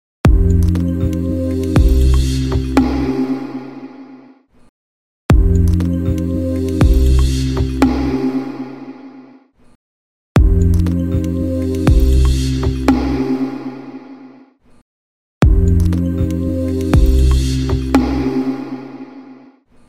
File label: Samsung Ringtone